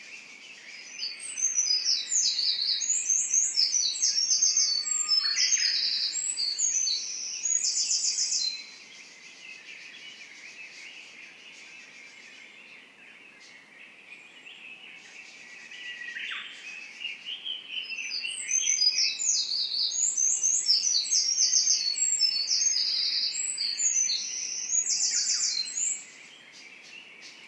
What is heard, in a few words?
Winter Wren